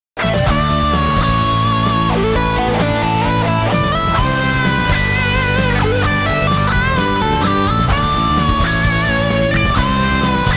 instrumental electric guitars

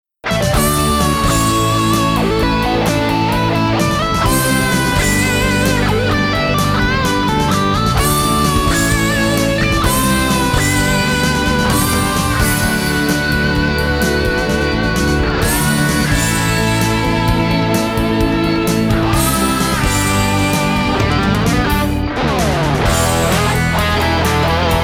electric full mix

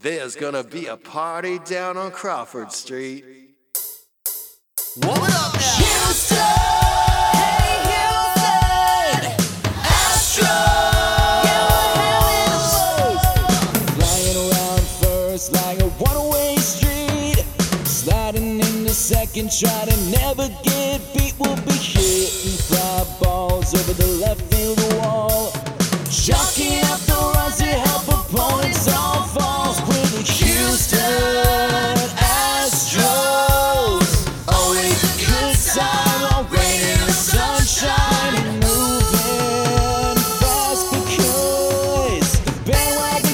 vocals rock

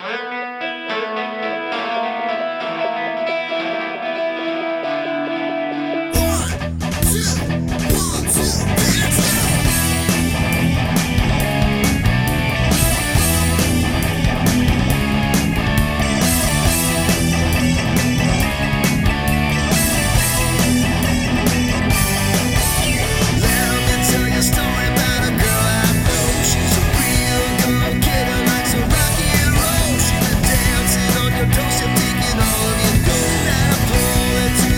karaoke full mix

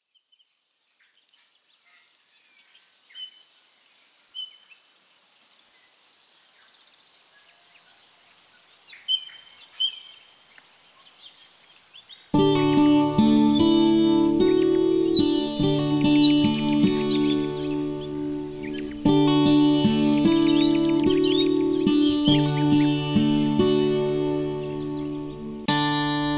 music ambiance melody guitars

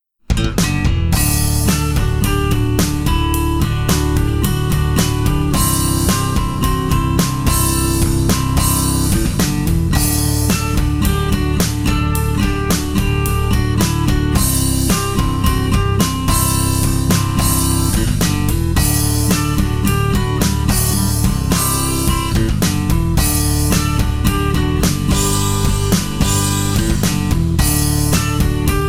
melody guitars

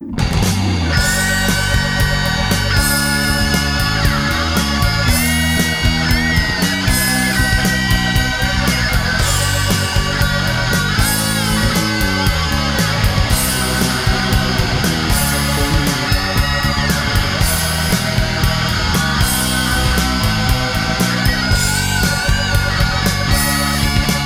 karaoke

